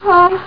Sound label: pant